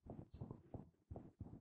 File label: footsteps